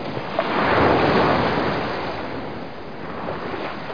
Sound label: sea; Driver